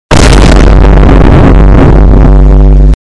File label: vine boom boom; memes